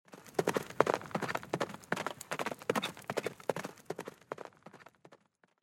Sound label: Escort Horse; cinematics; Age of Empires III - Complete Collection; Microsoft Studios